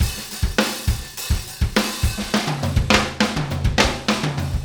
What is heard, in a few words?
Beat Loops, 103; Zero-G; Monster Beats